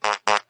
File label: farts; Loops; Electronic